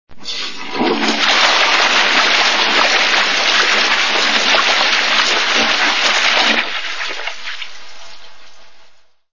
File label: wc flush